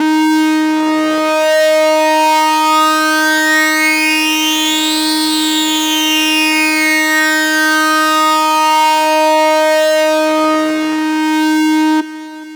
Riddim Melodic Hit - D#; One-Shots; Virus; Ghosthack; Riddim Essentials Volume 2

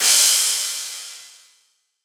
Crash; Hats and Cymbals

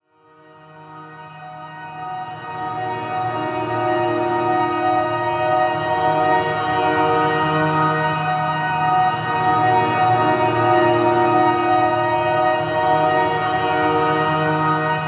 Pad D#; One-Shots; Pads; Ghosthack; Color Bass Essentials

